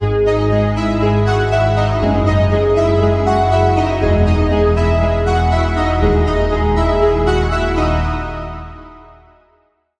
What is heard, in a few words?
Lead, Spire; Routed Sound; Spire Expansion - Reality Maker Vol.1